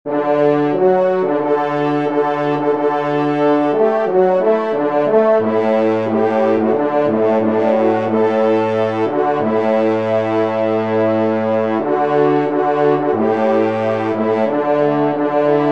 trompe; Offertoire; Messe de Rochard

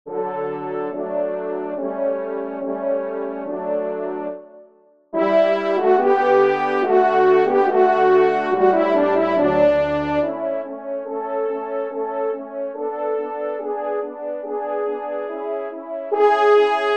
Trio Cors

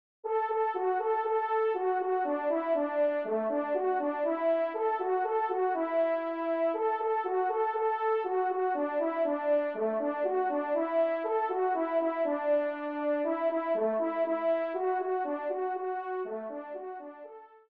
Rallye, trompe cors; Chaylard; Dordogne

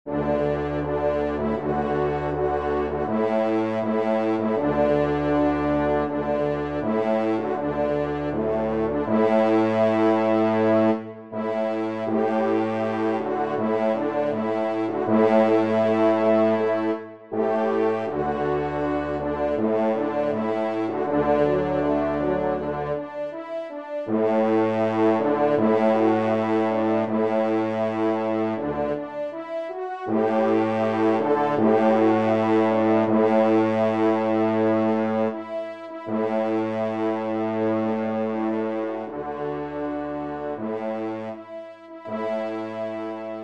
Hymne a Saint Hubert

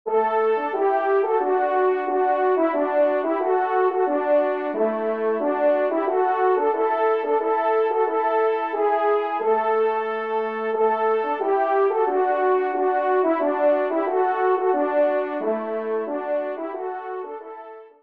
Tambourin Do Maj; 3e Tambourin; Corrette

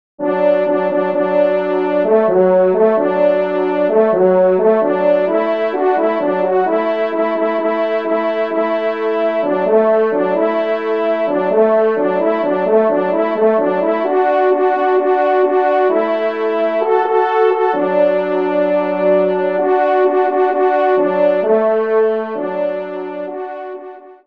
marche, trompe duo; messe d'estival

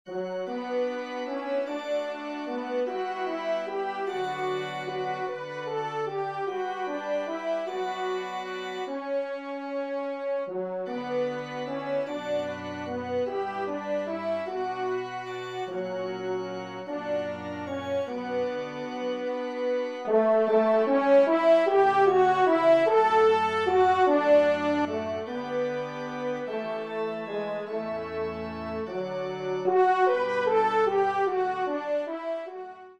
Elevation, trompe; Devert; Elevation 1e Messe

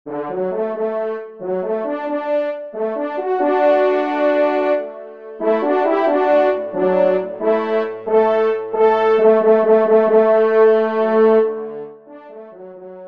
trompe; Vieux Charmont; Andrieu

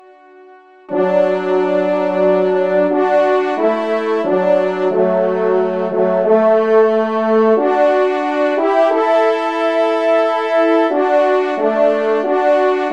Communion, trompe; Devert; 2e Messe Communion

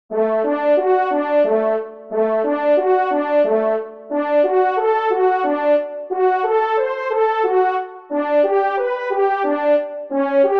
Quatuor, cor; Schubert Ave Maria

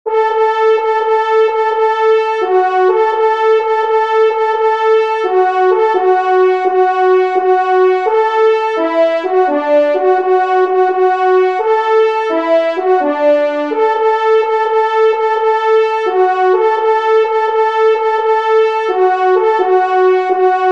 Pot Pourri, trompe; 1e Trompe

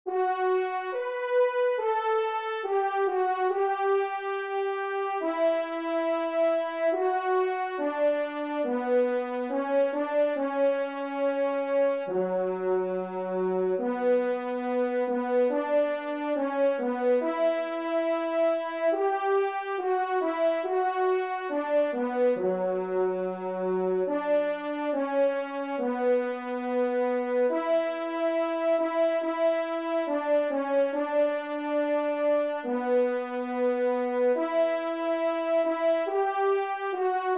Kyrie, trompe; Devert; 2e Messe Kyrie

